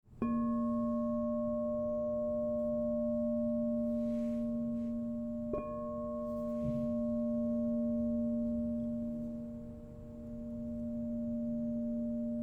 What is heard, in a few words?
Crystal Tones Singing Bowls